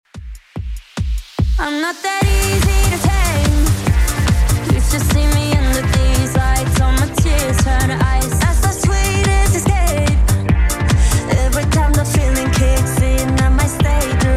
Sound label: Top40 Redrum 145bpm